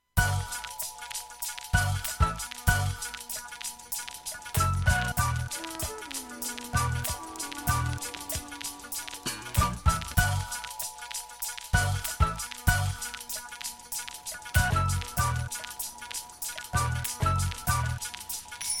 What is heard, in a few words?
beat